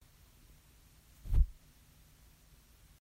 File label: Fabrics